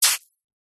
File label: burn finger body part touch hot surface sizzle short; zapsplat